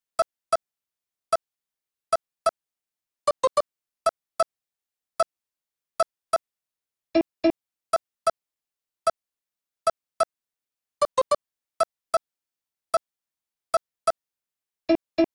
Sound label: 124 BPM A Min Pluck; Melodies; Loop Stems; Cymatics; GHOST (Beta Pack); Arsenic